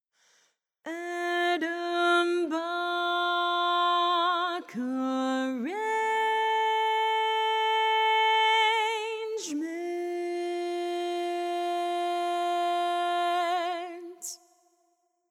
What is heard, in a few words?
Tenor, barbershop